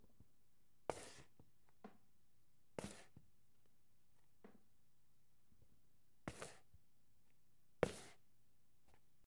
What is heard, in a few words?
Shoe Slide And Scuffle